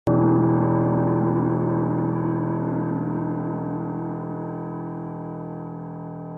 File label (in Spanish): Música Instrumental de Miedo Terror Suspenso Misterio